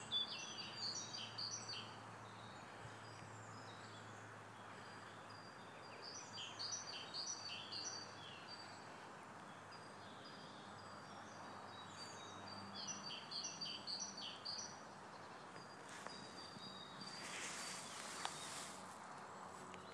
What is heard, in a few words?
answering bird song; co down